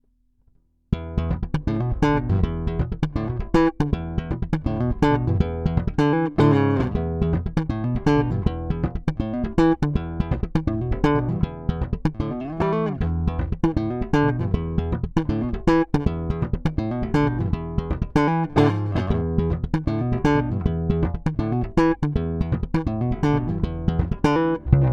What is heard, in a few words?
P Bass Slap Line